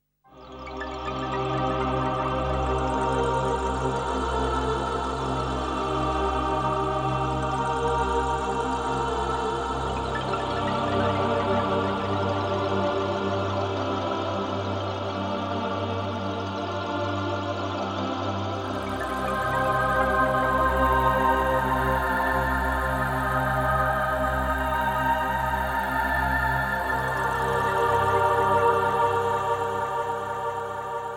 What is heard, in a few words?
Universe, Kurzweil PC 3; universe patch per kurzweil pc3